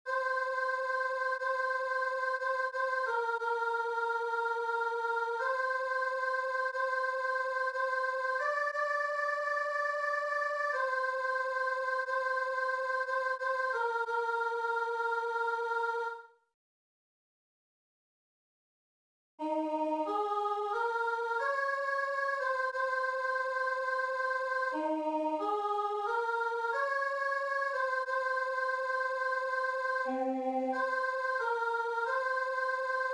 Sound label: tenor; Si ca vous chante; music mes 75 a 90